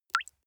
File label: single drops falling